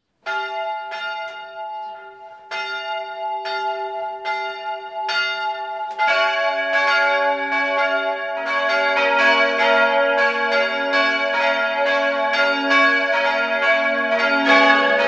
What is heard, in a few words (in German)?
Offendorf, Glocken